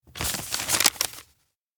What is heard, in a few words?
envelope peek; awayuki; anemoi